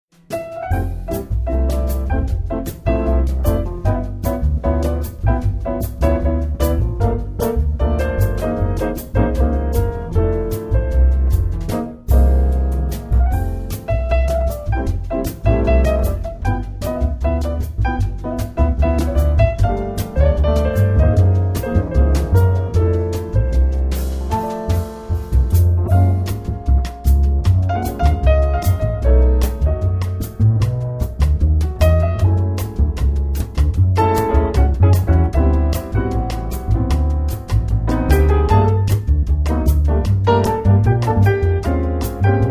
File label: instr Trio